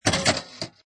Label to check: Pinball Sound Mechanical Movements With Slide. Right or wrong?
Right